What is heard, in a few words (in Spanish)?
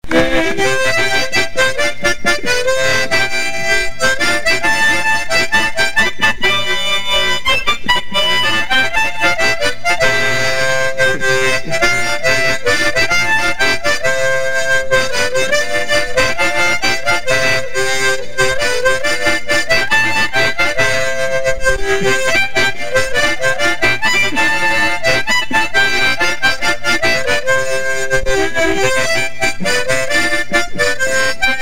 tsotsil adelita copainala; x aniversario la voz de los vientos